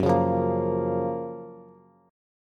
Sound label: F sharp Major 7th, Bass; strummed; JGuitar